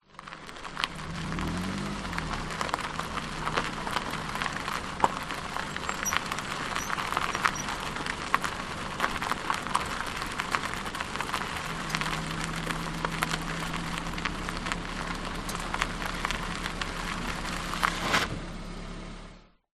vehicles car wheels roll engine on dirt track grit short stop